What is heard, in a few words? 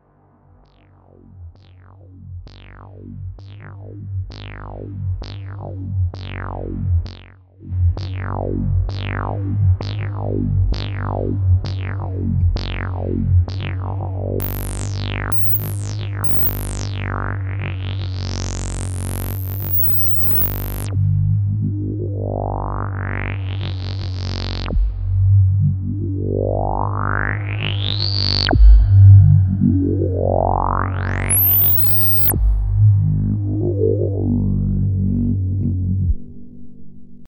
Dreadbox NYX V2